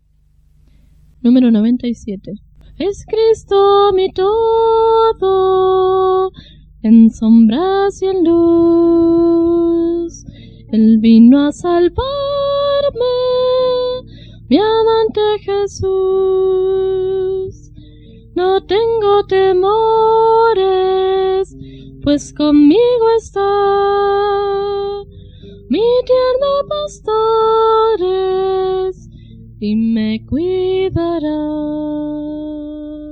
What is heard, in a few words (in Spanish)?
Coro, himnario niños